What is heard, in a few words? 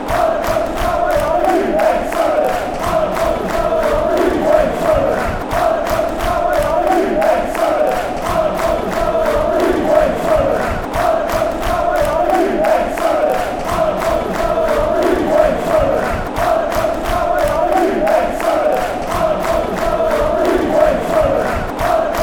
barmy army we hate sunderland fanchants; alan pardews